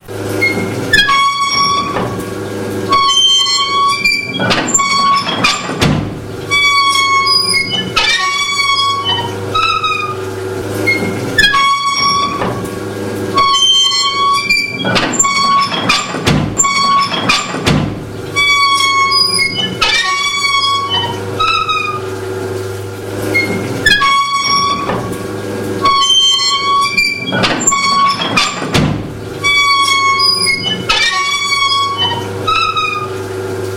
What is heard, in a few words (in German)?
Feuerwagen; alpspektakel